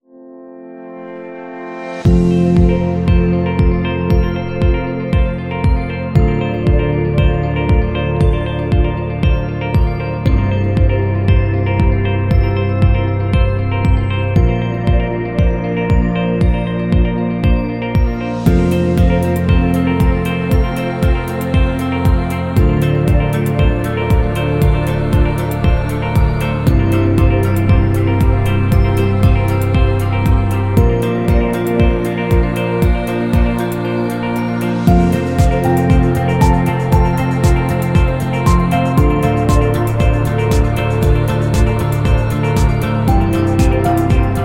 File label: Elegant Ambient; waiting music